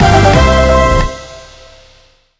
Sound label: Jingle Wheel Result popup